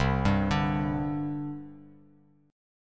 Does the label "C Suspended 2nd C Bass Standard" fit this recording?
Yes